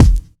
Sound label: clear bass drum single shot f key, Royality free bass drum sample tuned to the F note. Loudest frequency: 878Hz